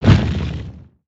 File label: Spinjitsu Earth Short Swing